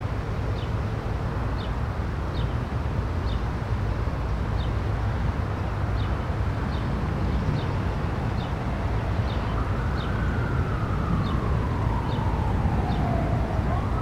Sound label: amb morningwood; amb mp morningwood; modern warfare 2; raven; ambient